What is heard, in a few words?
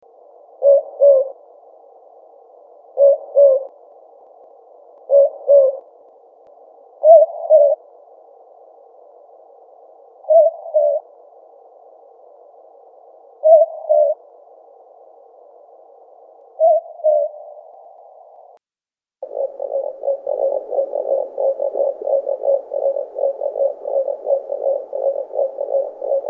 southern Boobook